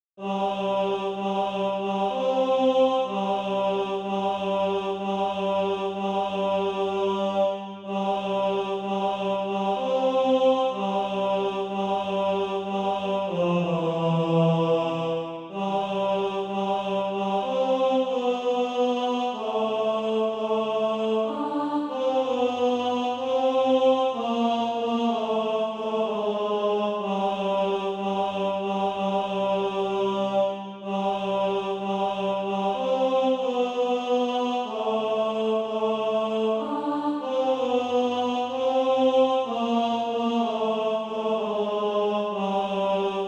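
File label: tenor, SATB Practice Music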